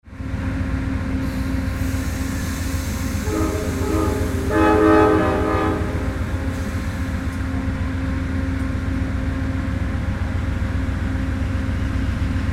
Train Departing

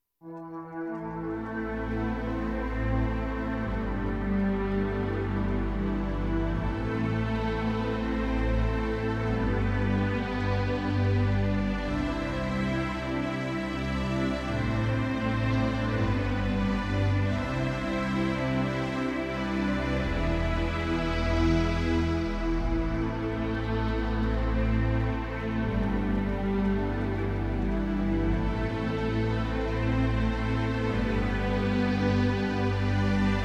RPG Intro

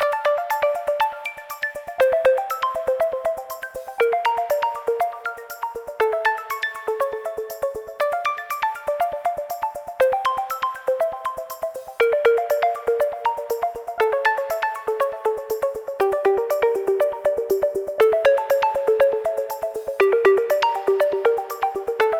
Light Drops, puzzle music

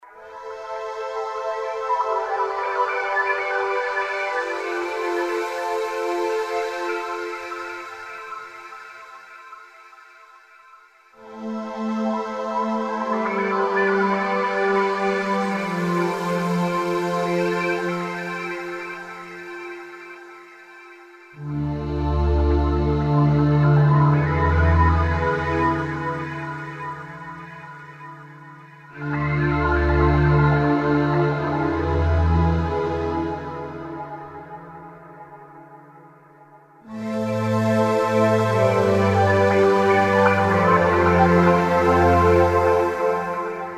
Confusion and Clarity Looping

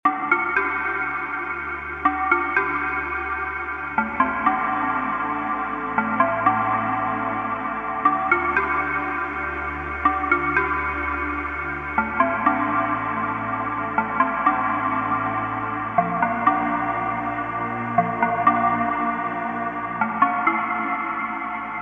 Alley Grunge LoFi

